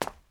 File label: FOOTSTEP CONCRETE